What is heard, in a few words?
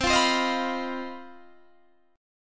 C Minor Major 7th Double Flat 5th C down Guitar DADGAD